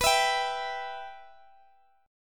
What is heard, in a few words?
B Suspended 4th Sharp 5th B Guitar Standard, chord; strummed; Bsus4#5; x,x,21,21,20,x; JGuitar